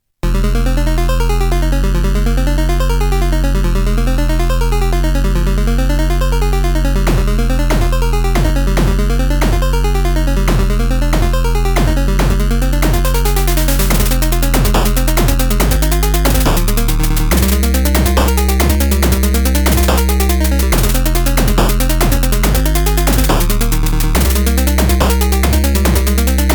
trap

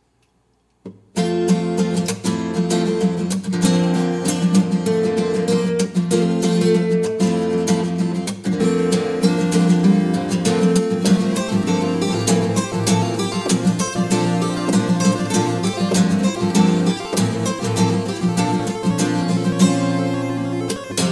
backing track

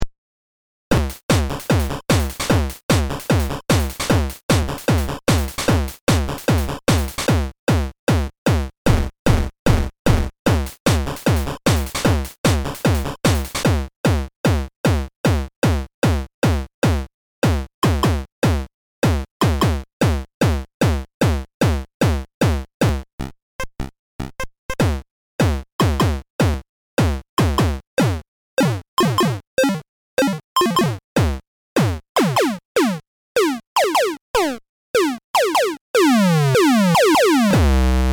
hardware pitch sweep example

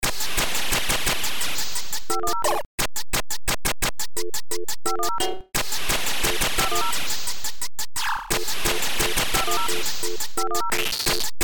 dial up glitchtune

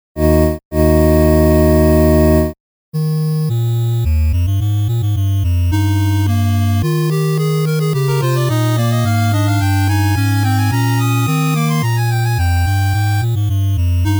fugue chiptune remix

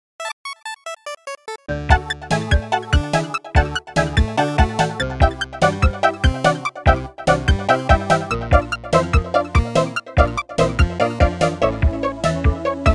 ocarina cover; ocarina of time

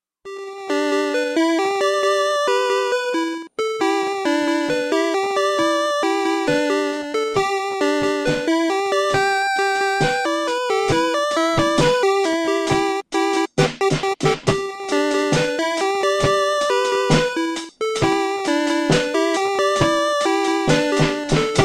lsdj vox acoustic drums

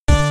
cool groove

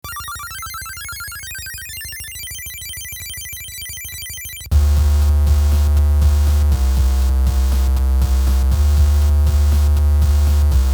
trash bass jam

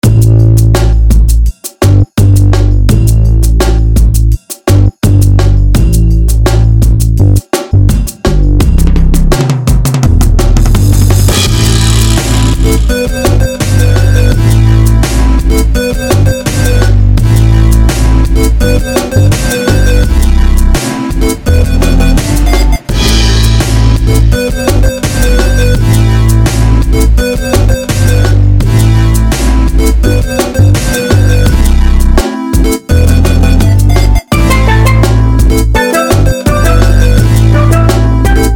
chiptune

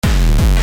lgpt dnb breakcore jam

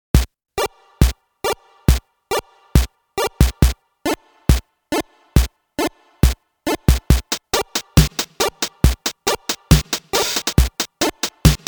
reggae tune

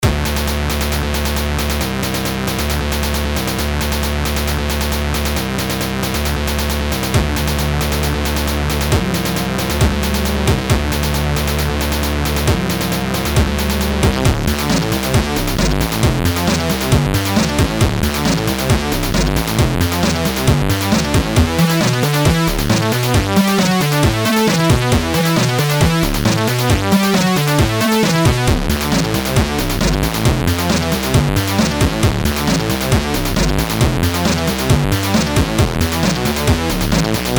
c64 loopable

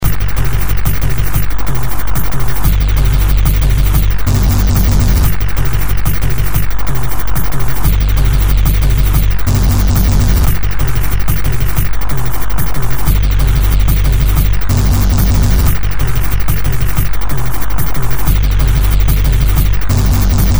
gun drum

